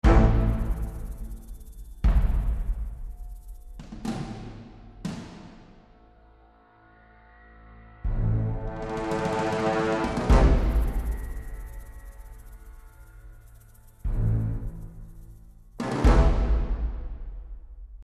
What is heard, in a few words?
Tension